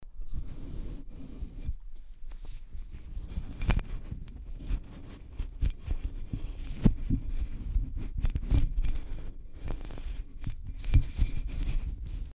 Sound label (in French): Defaut sonore bruit de manipulation